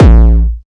Hardcore Bass

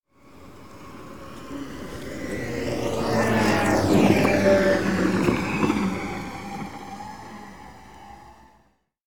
Segway Pass By

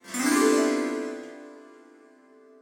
Harp Glissando Ascending Short

